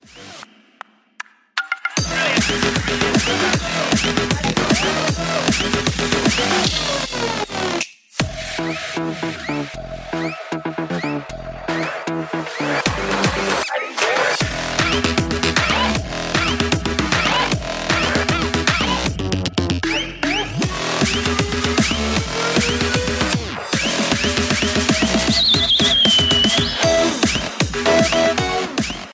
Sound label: Whistle Theatrical; Whistle Podu